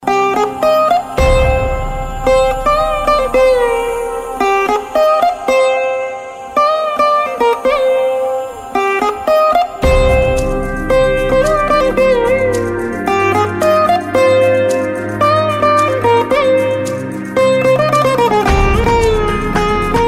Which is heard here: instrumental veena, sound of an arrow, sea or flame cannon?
instrumental veena